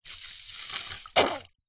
hospital syringe squirt out liquid full chamber squirt